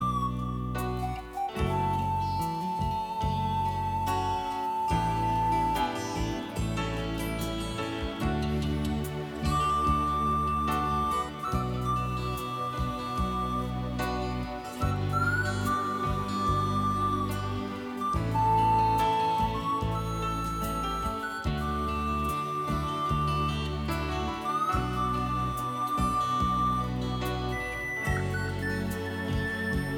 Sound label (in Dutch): fluit